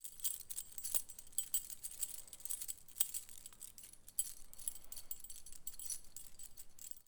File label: Keys Moving